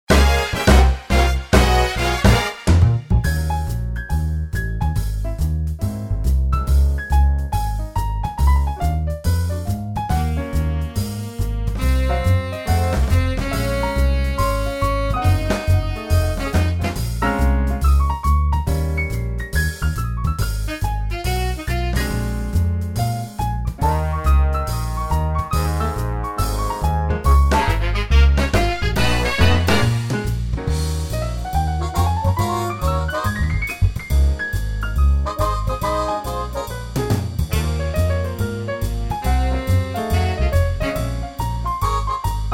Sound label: Big Band swing piano lead